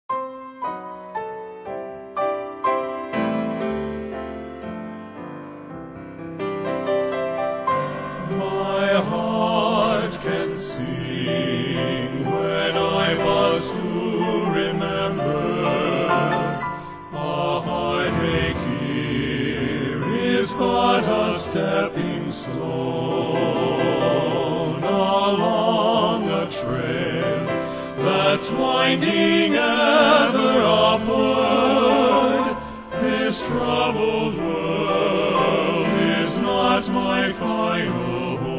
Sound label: men's chorus